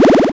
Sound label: Powerup